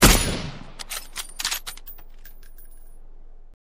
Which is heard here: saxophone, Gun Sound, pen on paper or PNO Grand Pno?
Gun Sound